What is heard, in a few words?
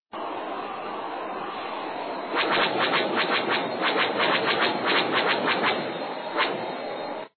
xwing